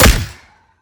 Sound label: Sciweap Shot Small Gun P70 Sidearm